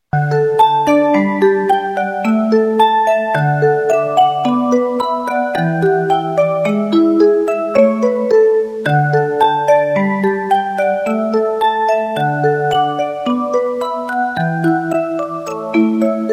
音乐铃声

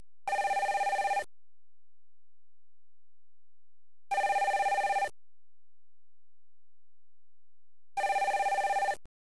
电话铃

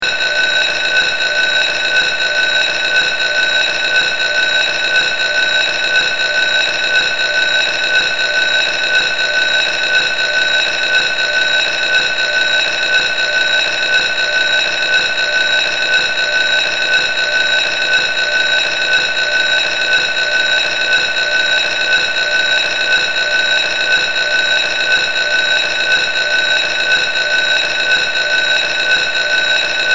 电铃

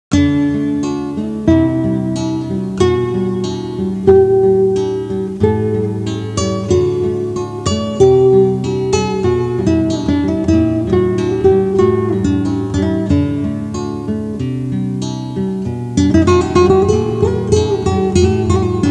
吉他

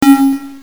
卡通, PPT音效

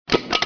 订书机

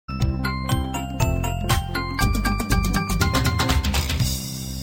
小学上课铃声; 广播室铃声系统

